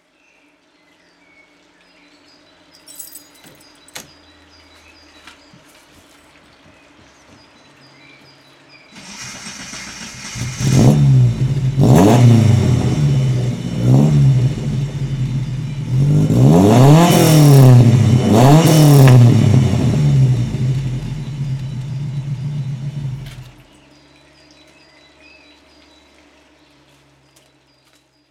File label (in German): Bertone Motor; Felix Adelmann; GOETZELMANN